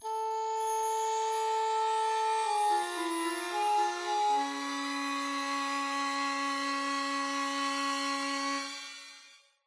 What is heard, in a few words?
Rnd Melody; tintin reporter cigars of the pharaoh